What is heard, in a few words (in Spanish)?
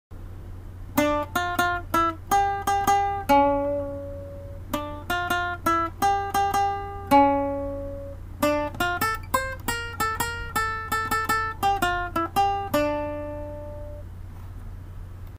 punteo abajo